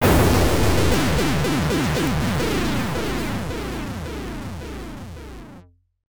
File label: Boss Out; Boss Out 2; Xak -The Art of Visual Stage; X68000